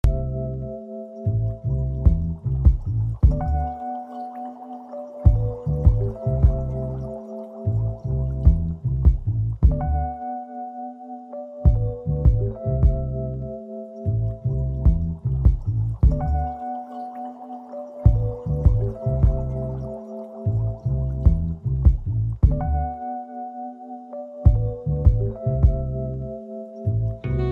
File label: Ocean Vibe